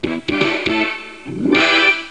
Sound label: Indy Boot